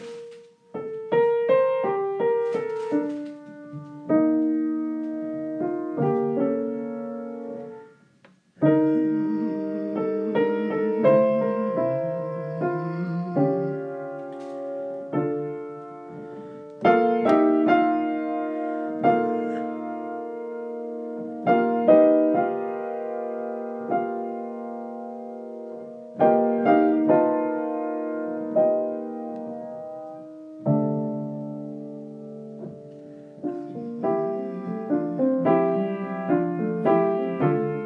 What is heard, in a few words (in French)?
improvisation